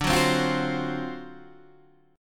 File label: D 7th Sharp 9th D, chord